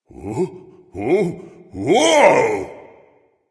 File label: fear, voice; Smite; ymir skin9